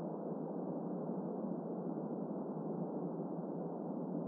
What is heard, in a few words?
dry air short tunnel; ambient